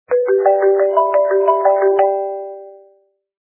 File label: iPhone